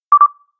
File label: BEEP BEEP, gamebuzzer; sloodle; gaming